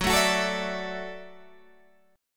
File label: Gb Minor 7th Sharp 5th, Guitar Open G